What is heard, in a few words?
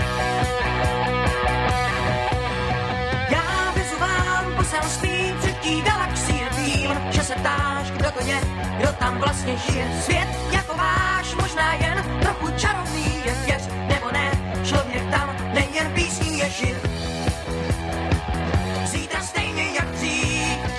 disco song